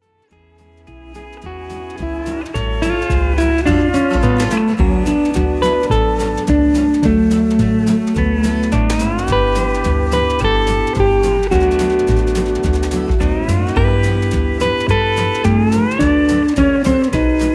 Western Swing

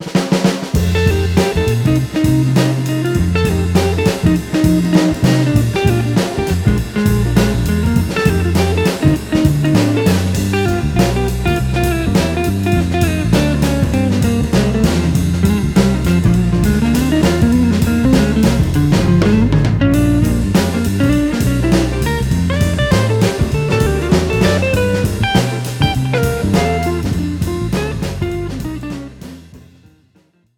Groovy Jazz

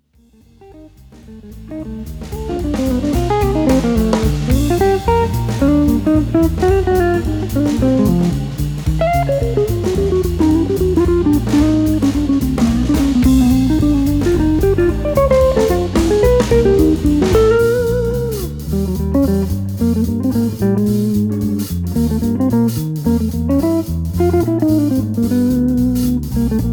Jazz

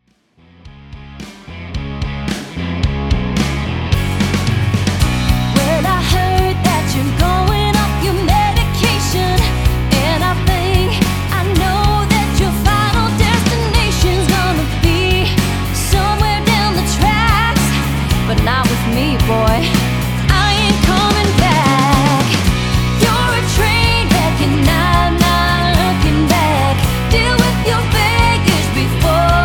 Pop Country

